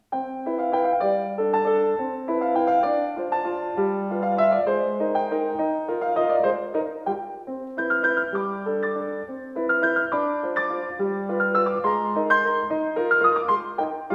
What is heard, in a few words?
waltz